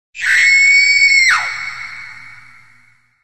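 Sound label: woman's fright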